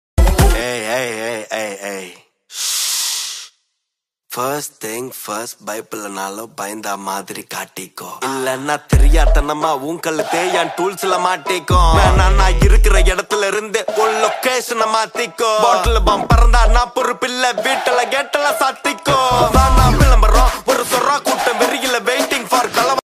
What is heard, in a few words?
rap song bgm; king of kotha; dq